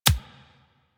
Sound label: Bullet Impact Hit Notification; Warframe; Weapons